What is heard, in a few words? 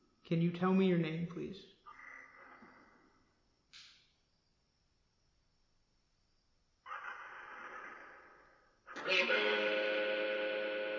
Ballroom Phasma Box